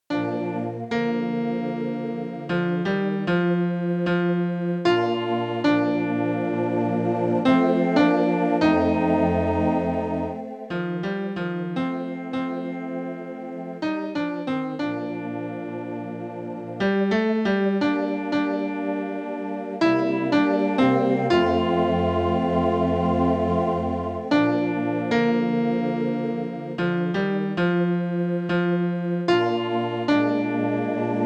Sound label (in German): Dir Herr 2. Tenor, jodlermessen